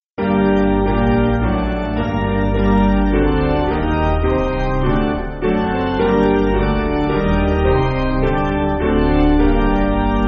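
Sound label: Piano, Music Backing Files for Churches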